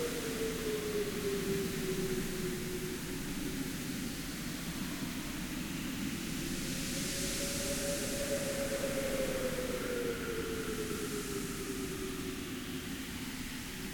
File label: Ambiance; Knytt